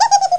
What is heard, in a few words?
HIHI